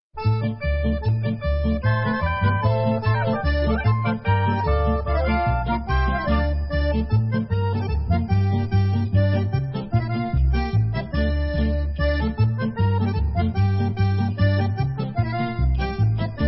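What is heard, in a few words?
Instrumental, Israeli Dances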